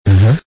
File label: hmm hmm